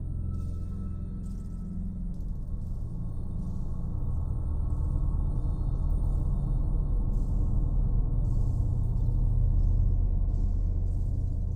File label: arid atmo inside; CrossCode